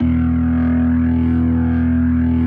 E1, B3

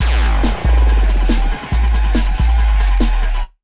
Big Beat Drumloops

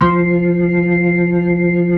B-3 Blues

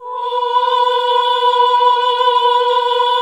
OHH B2, CLASSIC VOX6

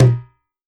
drm, WORLD DRUMS

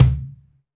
Cajon Low, Percussion; Roland BK-5

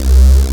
juno dirty, junopulse; Best Service Dream Experience; JUNODIRTY2-L

